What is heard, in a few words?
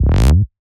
Slide A, Bass